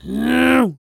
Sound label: A YELL, MALE HUMAN; ILIO; Vocal Planet